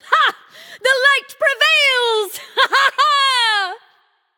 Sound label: "yay"; smite; nuwa